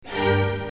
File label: accord